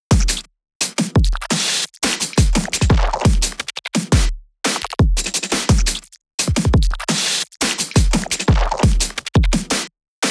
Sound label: drums and perc; Silo